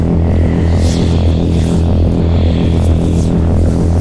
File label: bloodlust loop; LoL; tryndamere